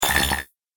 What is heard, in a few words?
Robotic Droid Noise; Robot Sound Effects